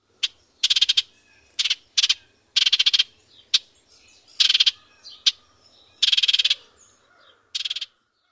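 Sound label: rattles and chits; NY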